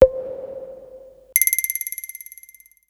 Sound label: SNEAKY HINT; Rosegarden; russia